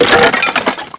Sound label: wood